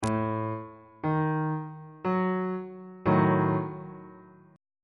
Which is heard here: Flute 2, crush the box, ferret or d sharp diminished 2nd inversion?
d sharp diminished 2nd inversion